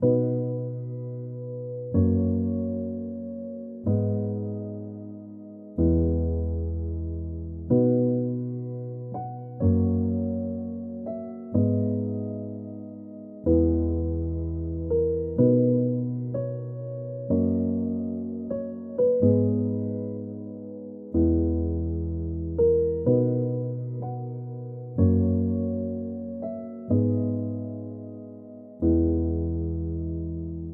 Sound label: inspiring cinematic piano 125bpm B major